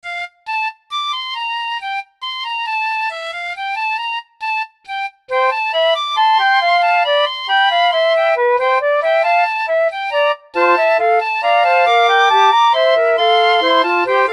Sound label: fugue